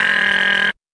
buzzer, Voice; ooh ahh

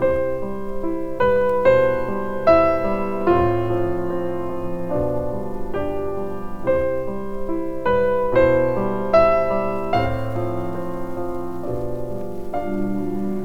piano, Sad Loops

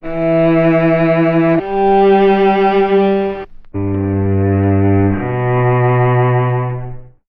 strings, Sad

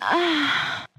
female orgasm

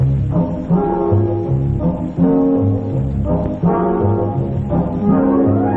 horns, Loops

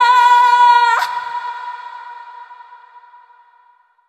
"oh" SUNG

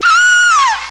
scream

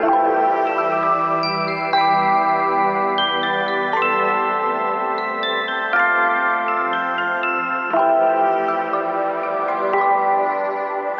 twinkling stars, electronic loops

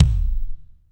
Kicks Medium

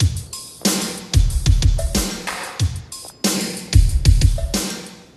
Drum loops, Electro

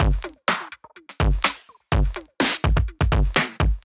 bubblez, Drum loops, Electro

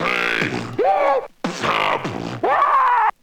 arbor day, Beatbox, Drum loops

